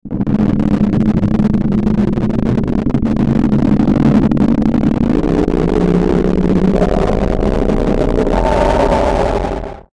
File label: Ascend Slow Distortion Crackle; Blastwave